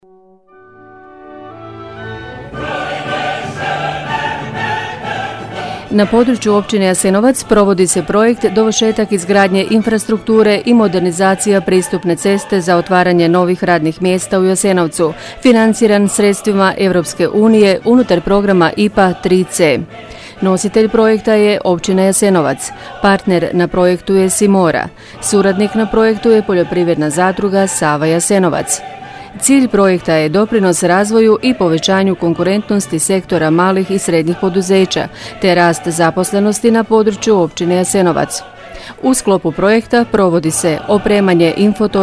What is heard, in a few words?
JINGLE